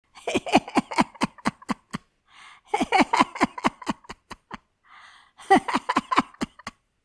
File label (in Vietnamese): tieng cuoi cua cu ba nhan hau lon tuoi